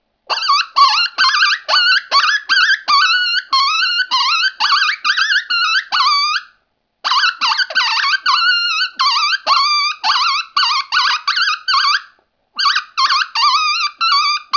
cow tip up, coyote

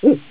owl

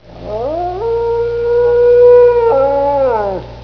Gray Wolf Howls

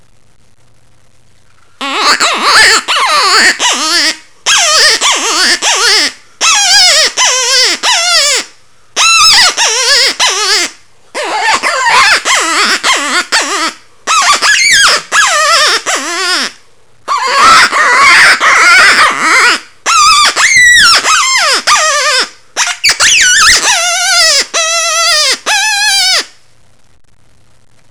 proto distress, rabbits

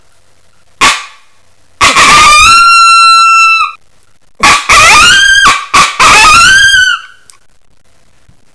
short howler howl

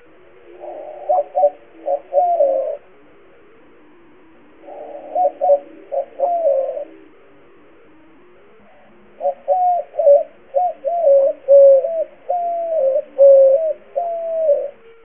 white wing dove; upland birds

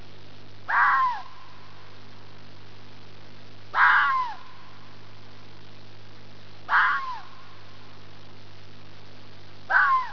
typical fox yell

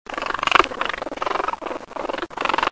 baby raccoons

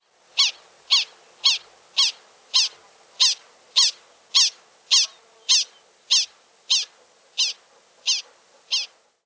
prairie dog

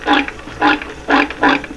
andr pig

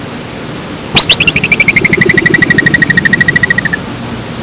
groundhog whistle

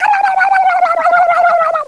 turkey

